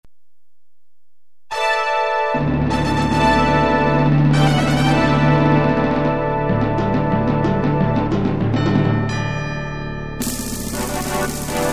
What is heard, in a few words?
fanfare